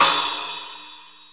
splash, Percussion; realdrum

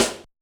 Yamaha DJX 2B, SD Elect.

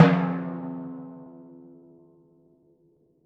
F2 f, Timpani, forte; PowerFX; Downtown Orchestra; scales

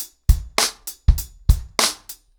100BPM, ACOUSTIC DRUMS; The Roots Drumloops

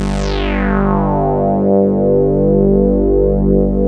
Kawai moog C3; Rubber Chicken Software Sample Bank

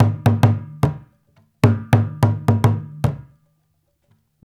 108 LAMBA, African Ethnic Drums; Spectrasonics; Supreme Beats

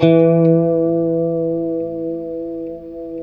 E JC120; Spectrasonics; Hans Zimmer Guitars VOL-1; JC-STRAT MF

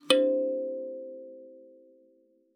KALIMBA C5; Xsample VOL-7

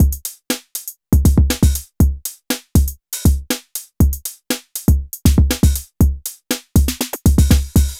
NRG Break, Trance, Drums, Breaks

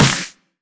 Snares; Vengeance - Essential Clubsounds Vol.4

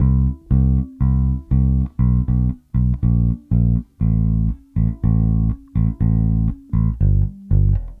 Bass, 70s Analog Funk

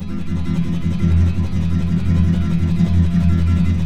F# M, Guitars; Spectrasonics; Hans Zimmer Guitars VOL-2; FLAM TREM CH

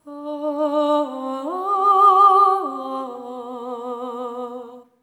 LAMENT

